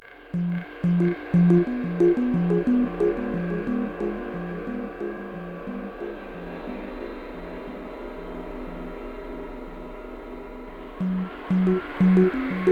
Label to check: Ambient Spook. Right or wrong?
Right